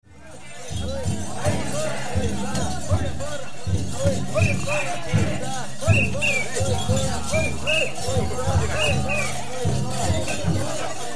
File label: taiko